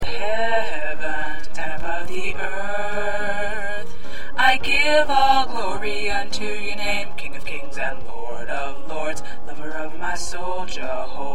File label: Tenor, choir